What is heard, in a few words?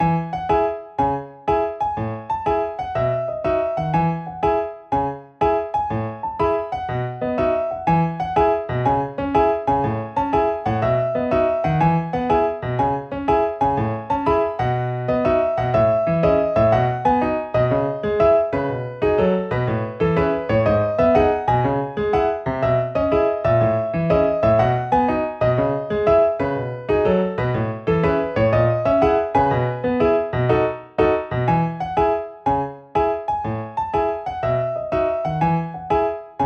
Piano